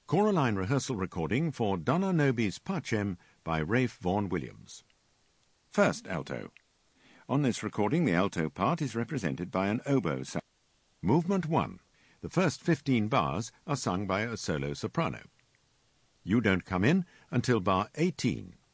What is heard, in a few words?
Alto; Vn Wllms Dona Nobis